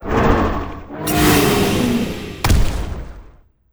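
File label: Boss Fairy Dragon death; monster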